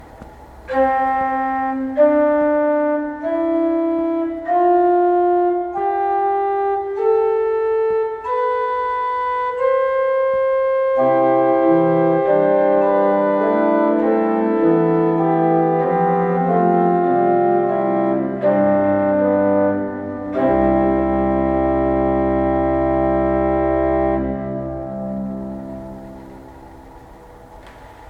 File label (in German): Prinzipal 8; Ottobrunn, St. Otto